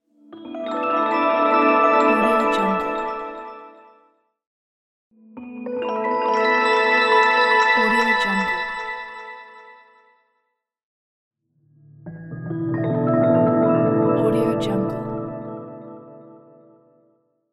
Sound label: magic slow appearence